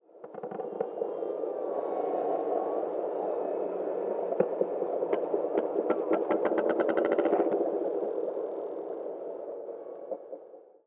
echo clicks; ambient soundscapes; dota

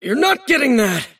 deny pain; davion; dota

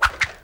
natures grasp layer; treant

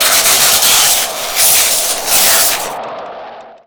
electric vortex; storm spirit

